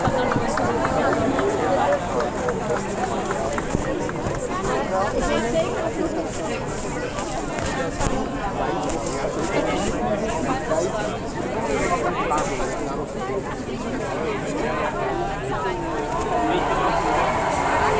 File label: foley